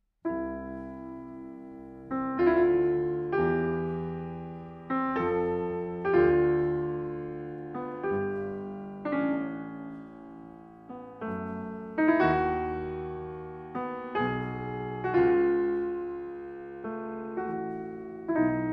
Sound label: pianoworks